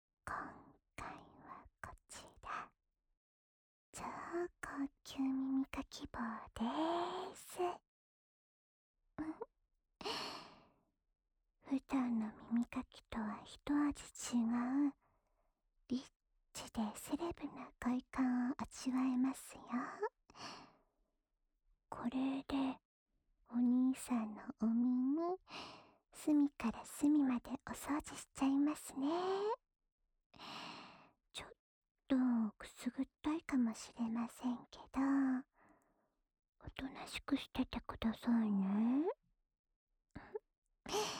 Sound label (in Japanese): 高級耳かき 左耳, ASMR